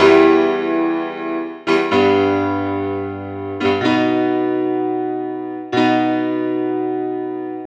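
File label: disco fever lick piano; Best Service ProSamples vol.48 - Disco Fever; AKAI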